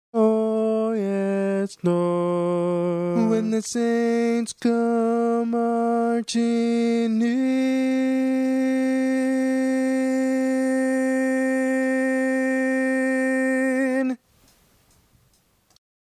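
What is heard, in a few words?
Baritone, barbershop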